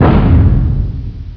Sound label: impact; steel